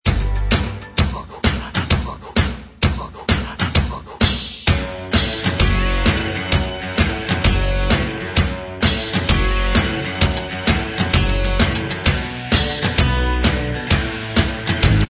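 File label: Instrumental Track, backing tracks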